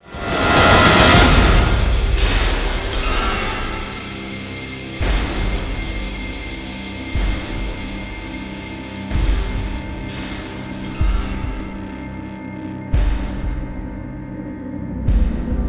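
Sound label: music arrive ambient; A3 OMEGA GATE; Transformers War for Cybertron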